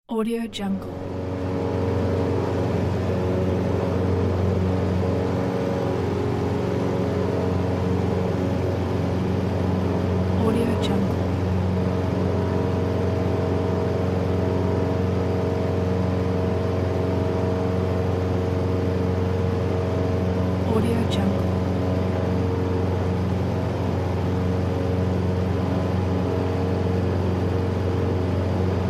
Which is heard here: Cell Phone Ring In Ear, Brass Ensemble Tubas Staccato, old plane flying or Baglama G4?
old plane flying